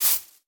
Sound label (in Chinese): 下界苗踩踏声